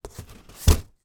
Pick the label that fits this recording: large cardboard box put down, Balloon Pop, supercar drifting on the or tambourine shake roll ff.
large cardboard box put down